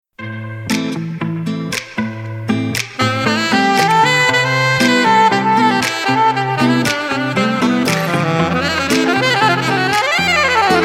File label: SAX